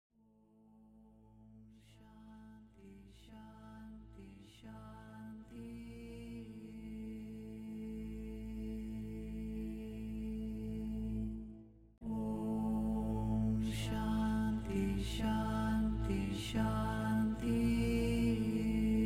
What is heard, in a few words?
OM Shanti Shanti Shanti w Silence; Meditation; guided; 20 Minute Meditations